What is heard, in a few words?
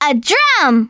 Drum; Losttoys